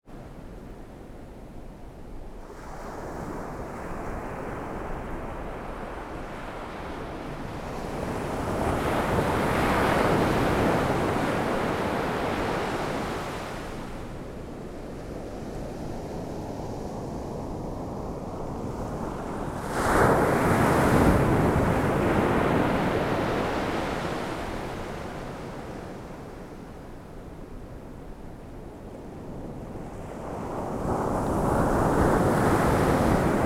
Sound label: OCEAN WAVES